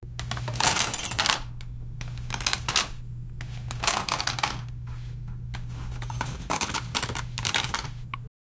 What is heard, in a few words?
cash transaction sound; finance